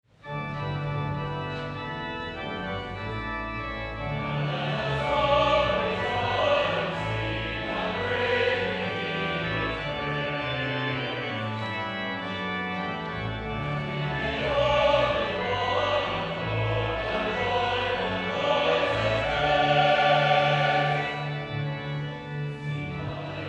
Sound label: Choir Now Let Us All Praise God and Sing